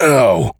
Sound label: Pain; Duke Nukem; Forever 2001